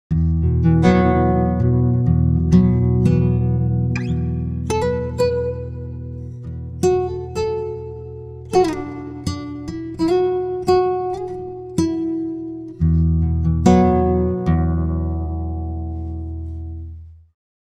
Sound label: guitar, pitchshift